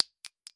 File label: pistol shell med, weapons foley; Spearhead Demo